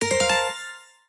Sfx Tennis

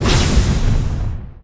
Mouth Explosion; gamerip; Hive Boss

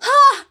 Stun

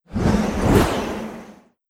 Spell Air

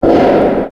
Bang Soft

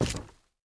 Nomal Wom Walk; Knight Online

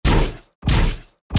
Walk

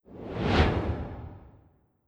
Vampire Run

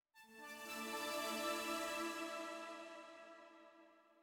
Progression Stinger; viewfinder

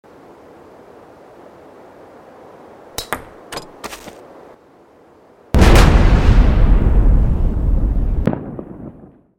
Explosives scene

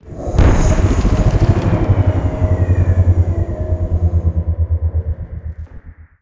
Timer Stop; Confusion Ending

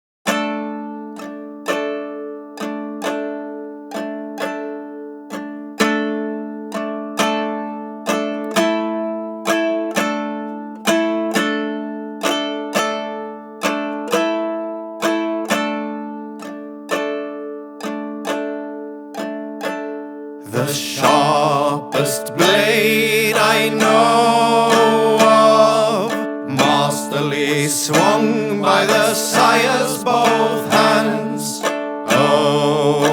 kitara+vocal+chorus

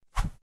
Woosh Stereo

